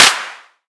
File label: Clap